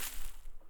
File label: Flail